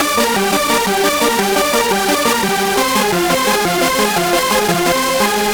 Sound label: Rave Keys; Drum n Bass; Synth & Melody; KJ Sawka; Drum n Bass & Beyond